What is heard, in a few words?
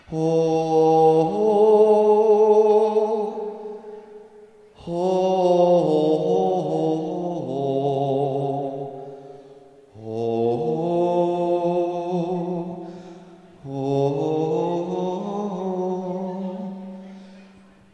Rain Outside